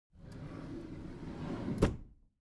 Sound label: object drawer packed smooth open soft